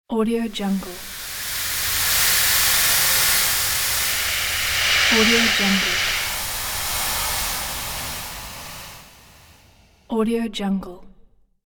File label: wind sea breeze